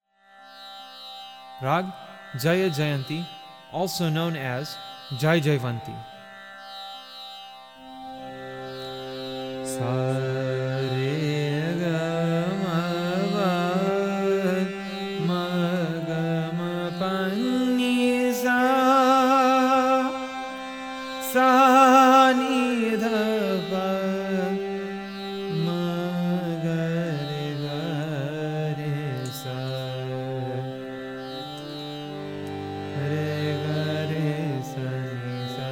jaya jayanti, raga

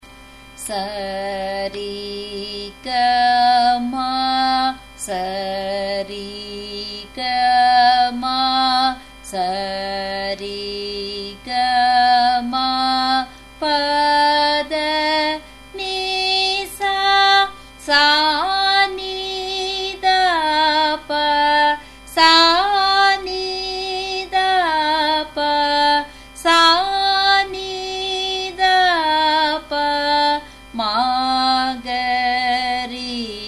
Sarali Varise; Sarali 04; Carnatic Music Basics; 5 kattai (G)

